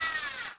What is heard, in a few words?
droid servo